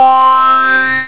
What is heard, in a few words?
beep; xfce